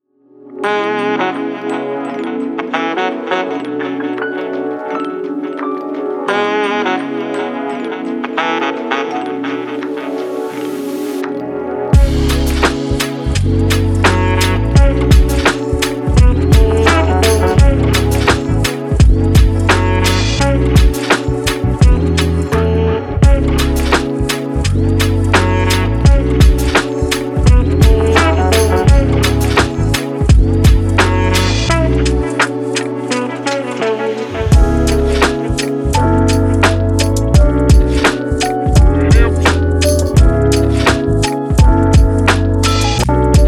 sweet vlogging

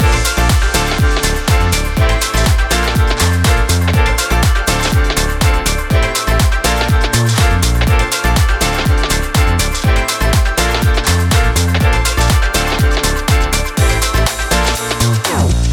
bright uplifting dance

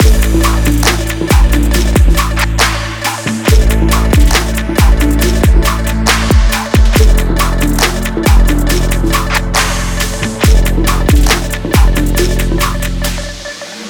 dynamic sport action trap loop

